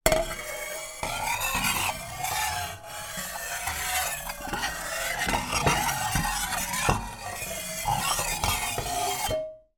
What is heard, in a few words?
pan scrape on stove hob metal on metal